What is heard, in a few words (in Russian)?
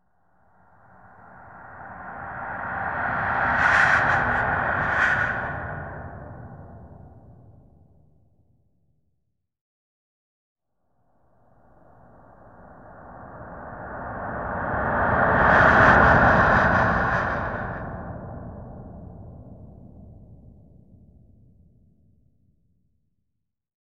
trevozhnij zhenskij vdoh effekt eho